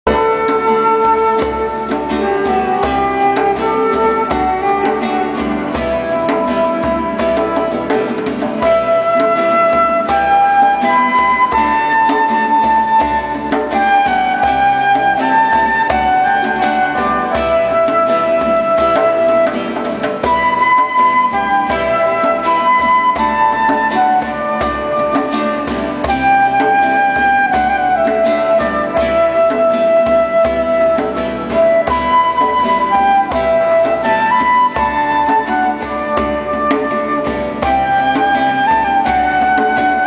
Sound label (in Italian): Vieni dal Libano (strumentale); canti neocatecumenali